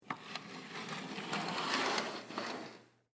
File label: Curtain Open, Household; BlastWave